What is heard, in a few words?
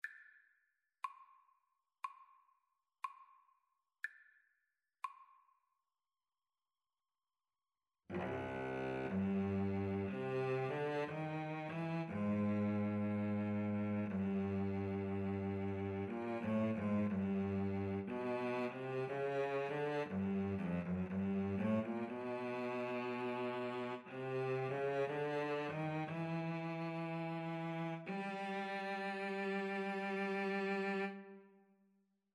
Cello Duet